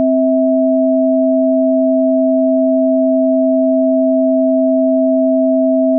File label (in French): sine